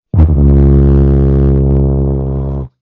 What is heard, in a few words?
tapering note, tuba